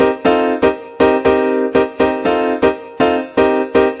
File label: 120 hard happy piano line; KEYS ORGAN PIANO LOOPS